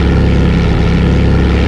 wasp; fgdata

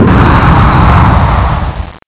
exhaust shutdown; fgdata

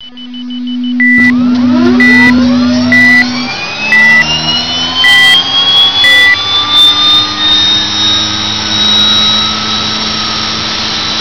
turbine start; fgdata

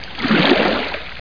wave; fgdata

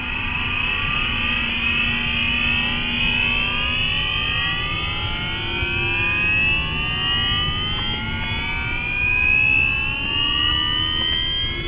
starter start; fgdata

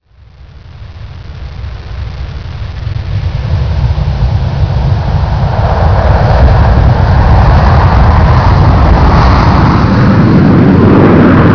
exhaust start; fgdata

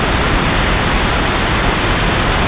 wind 8khz; fgdata

